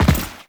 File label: Land Default, Footsteps; Warframe; Teshin